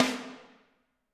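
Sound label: snare drum, Drums; Bob Clearmountain Drums I; SD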